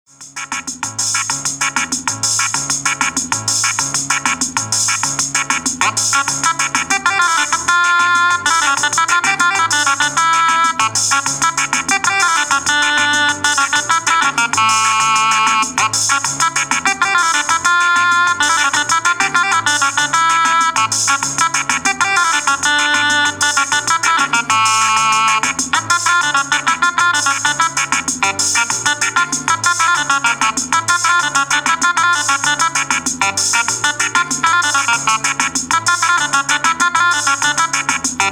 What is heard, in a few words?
magic organ; Belgium